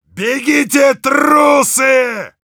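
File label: Heavy battlecry, Russian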